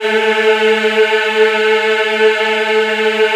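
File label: A3 men women eh, Choir; USB Soundscan vol.28; AKAI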